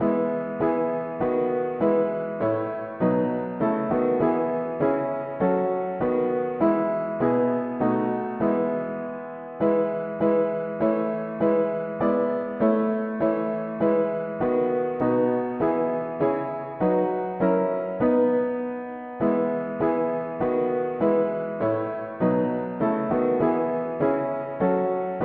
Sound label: mono piano; Lord dismiss us hence with gladness; hymns